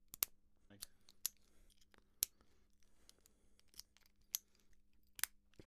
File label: Lockpick Sound